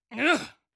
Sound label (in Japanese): 攻撃